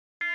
Alarm Beep Med Pitch; alarms

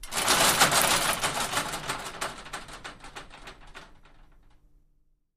Door Accordion Sliding Metal; Shake 3x; Metal Gate

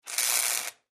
Arc Welder; arcs & sparks

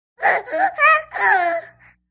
Baby Girl Donkey Sound, twenty month old

